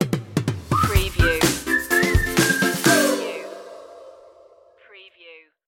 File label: tell me more stinger